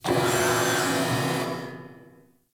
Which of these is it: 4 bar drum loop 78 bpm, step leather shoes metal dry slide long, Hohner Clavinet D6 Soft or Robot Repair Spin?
Robot Repair Spin